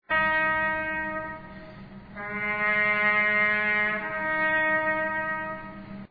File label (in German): zapfenstreich